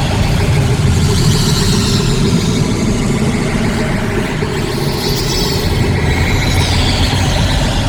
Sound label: portal loop